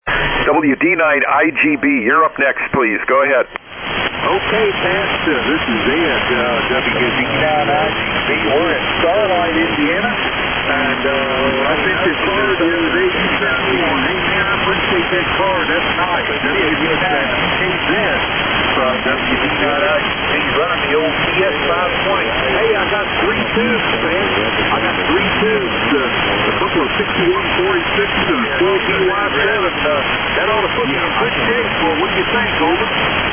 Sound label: Kenwood TS-520S & MC-50 Mic; Borden, IN.